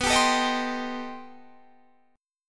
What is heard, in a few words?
C Minor 6th B; Cm6/B